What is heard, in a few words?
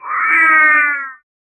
the main character has re, upgrade